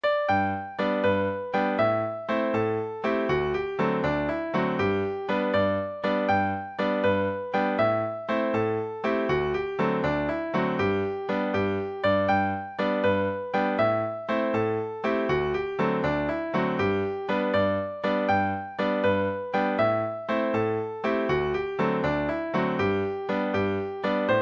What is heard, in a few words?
harmonica